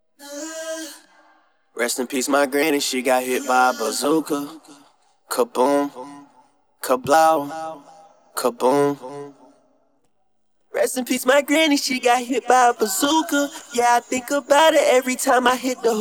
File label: vocals